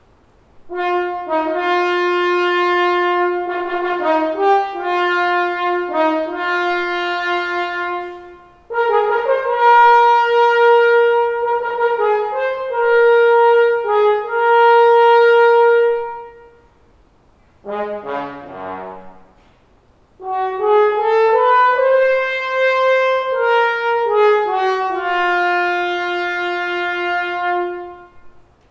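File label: hand hammered bell flares, horn; spun vs hand hammered bell flares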